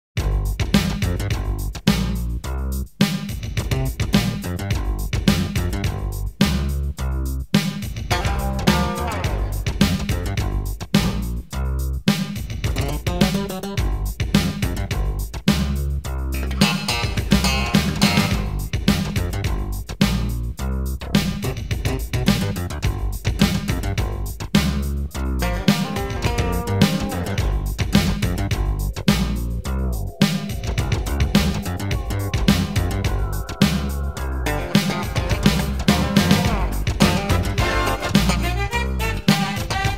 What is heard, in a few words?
Drumskate; From Subway Soundtrack